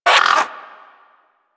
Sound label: Bird Tranq Dart Grunt; Warframe; Hunting Critters; Sawgaw